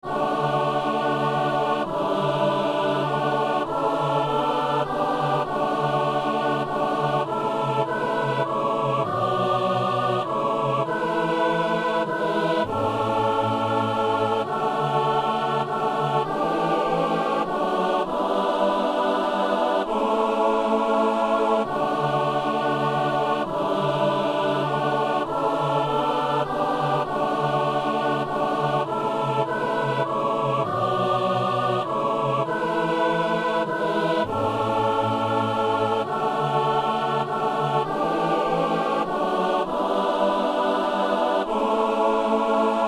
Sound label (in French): messe agnus; messe de saint boniface